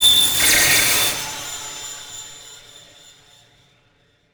Transition Communication Distortion Scanner

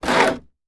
Household Wood Door Slide

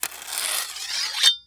Sword Scrape Cinder Block, weapons; AdobeCC

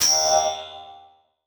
Transition Hit Impact Ping

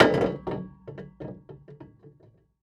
Impact Propane Tank Set Down On Wood

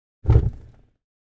Fire Flare Start Up Ignite; Fire and Explosions

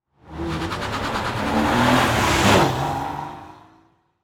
Transportation Car Race Car Drive Off Spin Tires

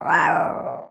Animal Mammal Carnivore Cat Bobcat Snarl; animals; AdobeCC

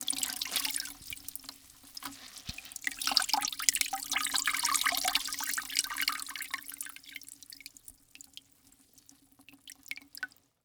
Liquid Water Mop Ringing Out In Mop Bucket

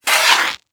Industry Tool Shovel Dig In Sand

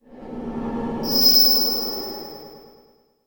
Transition Sweep Scanner; Production Element

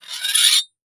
Horror Scary Knife Metal Scraping Metal

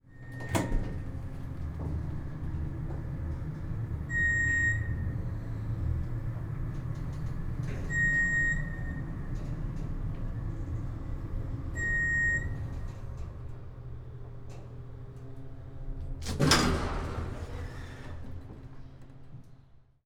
Industry Elevator Moving Through Floors

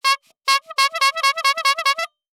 Cartoon Clown Horn

